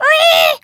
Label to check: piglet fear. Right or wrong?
Right